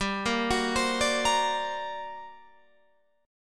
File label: G Minor Major 11th, Guitar, Open F; arpeggiated; 19,17,18,19,17,17; JGuitar